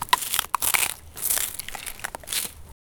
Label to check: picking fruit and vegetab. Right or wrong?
Right